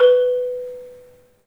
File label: MARM, 3D MARIMBA; 3D Sound; 3D Keys VOL-1